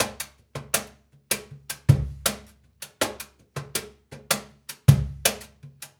Cajun, Percussion, Drums; Essential Percussion

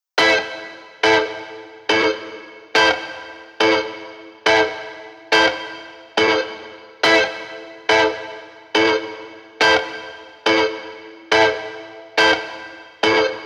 140BPM Amin Guitar FX, Offbeat Dub Guitars; Antidote Advent

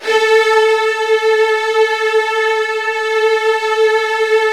Strings A2 R; Virtuoso

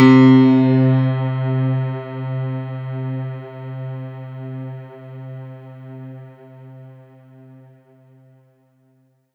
C3, synth; 90 s Sample CDs; NiceBeats; SynthLine VOL-23

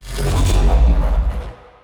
neuro, bass

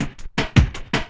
LOOP SD; Ueberschall; Drum & Bass Resonance

